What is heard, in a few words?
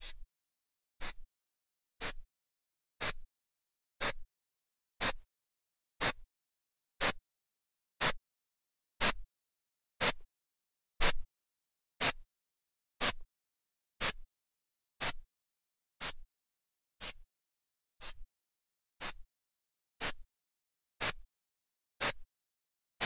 Side, noiseburst